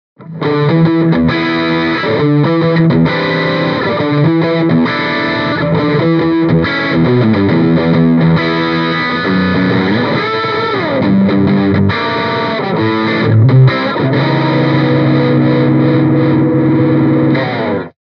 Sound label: Mic Inside G12H 75 Distortion; Mini Rox